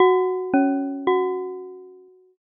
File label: church bell